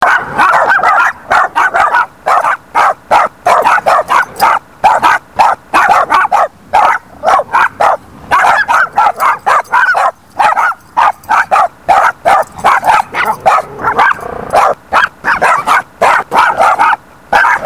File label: Chihuahuas, barking dog; corner of scott